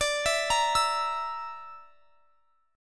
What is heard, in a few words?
D Suspended 2nd Sharp 5th D, guitar; Dsus2#5; arpeggiated